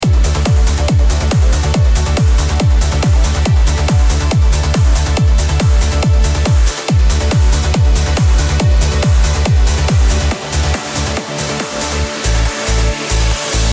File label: Uplifting Only, Transition; Uplifting Only 671; No-Talking DJ Mix